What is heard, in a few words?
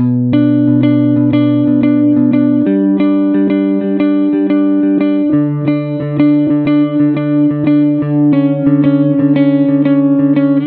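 90BPM D major guitar loop; lead arp chord melody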